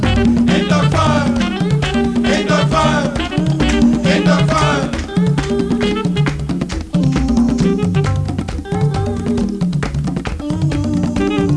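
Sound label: rhythm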